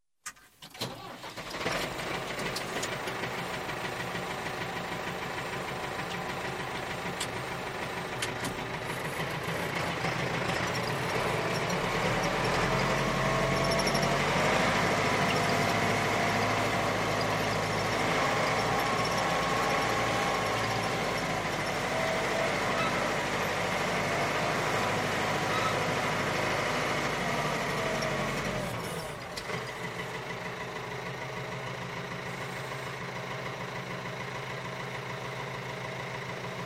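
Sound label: tractor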